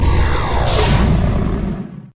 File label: laser